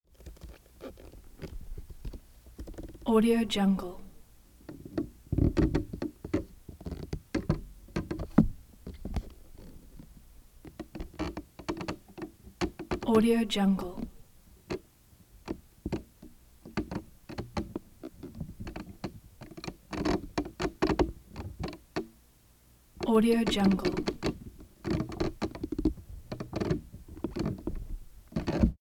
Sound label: wooden floor creaks multiple variations; prosoundfx